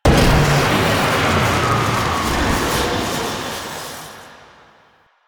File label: Connector Energy Explosion; Warframe; Ambience; Entrati